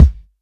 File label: Tight Kick Sound D Key, Royality free kickdrum sound tuned to the D note. Loudest frequency: 123Hz